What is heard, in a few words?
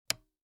switch